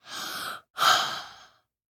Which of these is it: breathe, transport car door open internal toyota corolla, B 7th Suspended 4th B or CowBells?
breathe